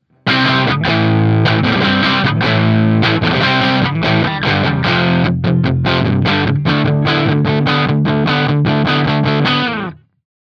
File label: rock; ampvergleich